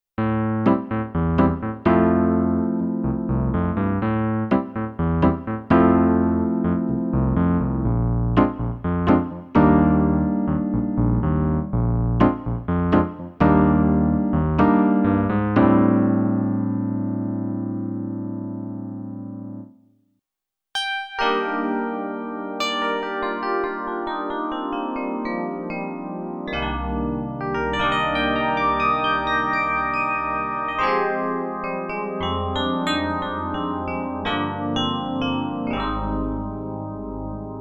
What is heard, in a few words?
MP11 E Pianos